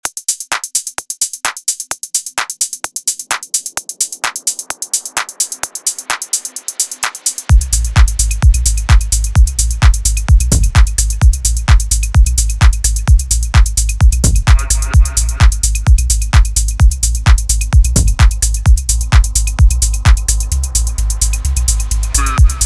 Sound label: Drum circle